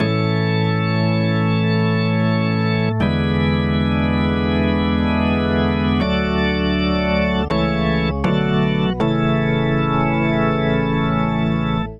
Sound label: Progression Gospel Organ I - V7 - IV - I A Major 80 bpm; Electric Keyboards; Ableton; Factory Packs